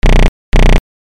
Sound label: ALARMS